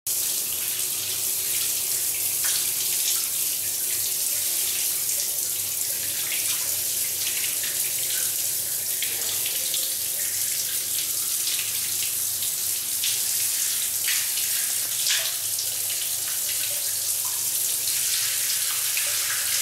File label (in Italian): Il suono della doccia shower sound; riconosci l'oggetto dal suono